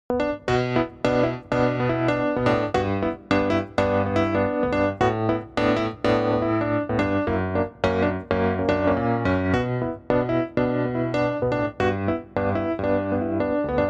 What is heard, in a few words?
Electric Grand